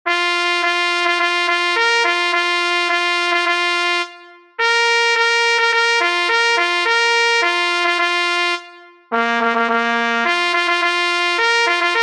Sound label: fanfare; fürstpless